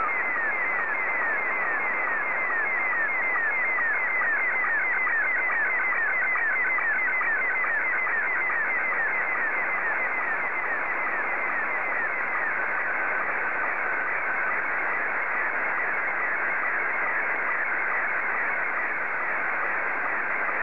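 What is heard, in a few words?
SSTV, Scottie 2; JA3OEN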